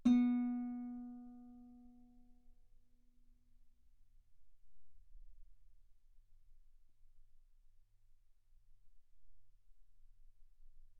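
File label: Guitar ff B string B3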